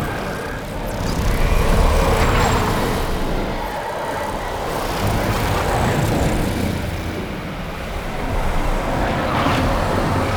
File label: Void Energy Sphere Loop; Ambience; Props; Warframe; Entrati